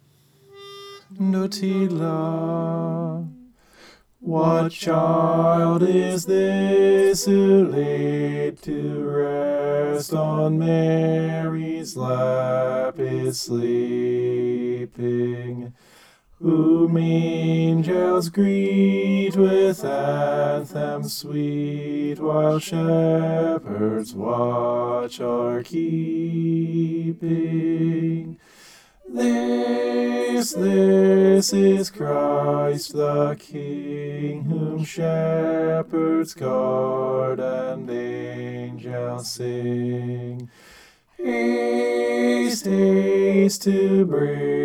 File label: bass